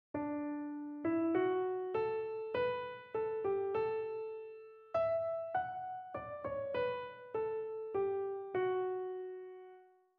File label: Continue the melody example with bad answer